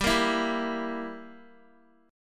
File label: G Minor 6th, Guitar; Gm6 strummed; x,10,10,9,7,x